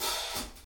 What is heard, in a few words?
90s dark swing open hi hat sample d key, Royality free open hat sample tuned to th